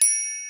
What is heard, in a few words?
beep